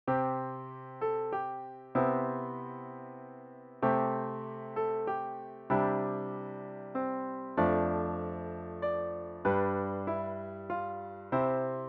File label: Silent Night organ